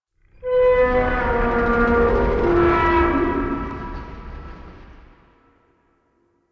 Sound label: Ambient Tank Vocal; Warframe; NineteenNinetyNine